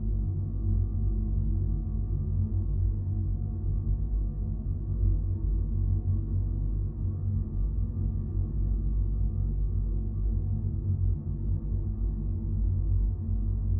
Drone heavy bass dark distant mechanical dissonance